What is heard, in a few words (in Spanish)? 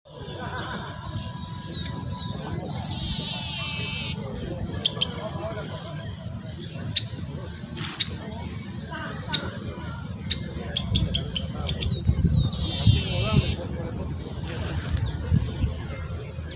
Varillero congo canto, Varillero Congo (Chrysomus ruficapillus)